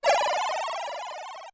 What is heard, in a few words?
Sword Shoot; Sword shooting magic